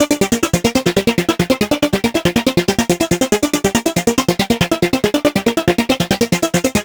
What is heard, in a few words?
Techno Synth